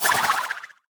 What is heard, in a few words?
pandas ranged hat; wukong tower